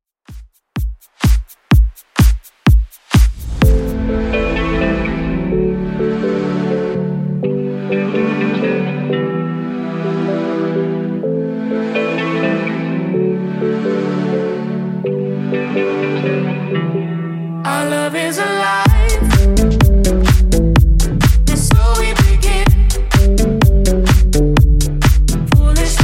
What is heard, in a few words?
House DJ Friendly 126bpm CLEAN